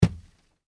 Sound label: impact snowball car window; Impact - Snowball Hits Car Window; Drops; McKinney Sound